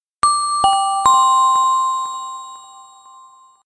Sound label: Chimes